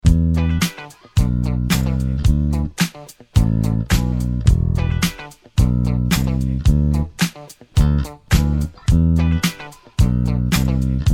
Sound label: bass